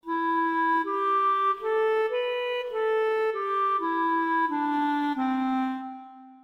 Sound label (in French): Clarinette sans pitch; Realisme